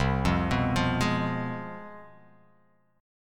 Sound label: C Major 7th Suspended 4th Sharp 5th, down